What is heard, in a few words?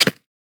Short foley